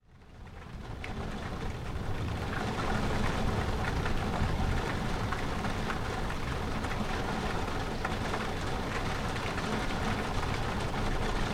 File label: Thunder